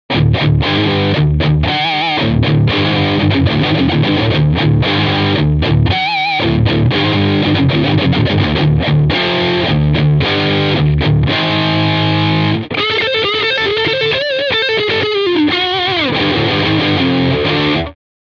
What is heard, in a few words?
Overdrive